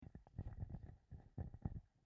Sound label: Hand Neumann KMS105